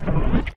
Bone Open

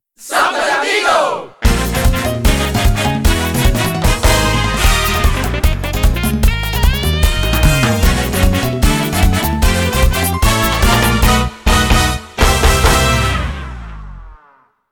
Title (w-voice)

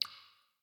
Cave Random Drips; The Inn

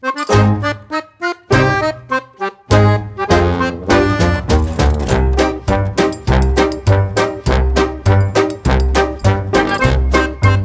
Chomp Chest

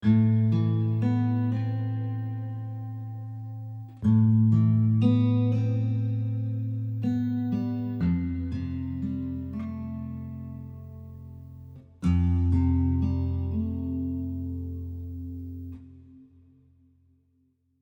wielder temple head 60bpm; chicory a colorful tale; macos ps4 ps5 switch windows gamerip 2021

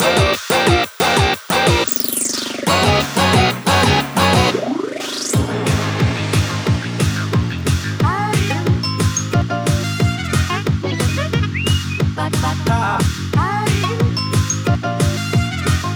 Circus (Battle Beat); Splatoon 2; Octo Expansion; Tentacular Circus (Last Checkpoint)

